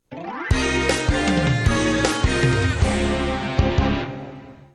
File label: Commercial Bumper Sting